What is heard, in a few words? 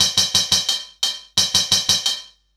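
ride, perc loops, 175, drum and bass; EZRollers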